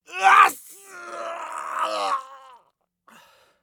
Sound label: scav death, voice; Escape from Tarkov; scav4